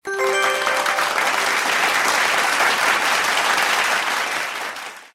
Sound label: win; RS Games Sounds; War